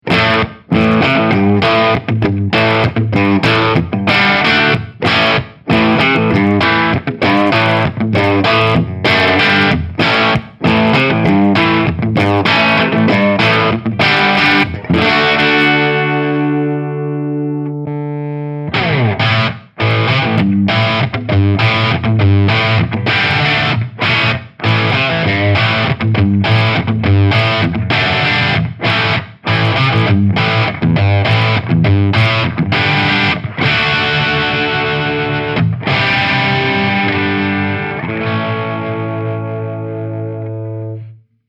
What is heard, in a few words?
Crunch Voice 1 Blend 2